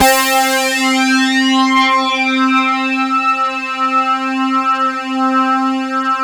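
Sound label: synth Fat C4; Best Service Gigapack 1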